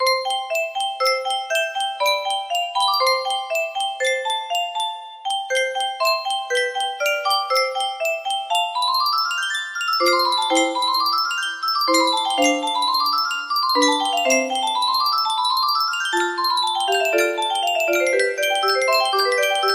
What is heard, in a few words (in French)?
sonate en C, music box melody